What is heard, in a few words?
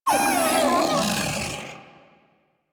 Feral Vocal Death; Enemies; Kubrow; Warframe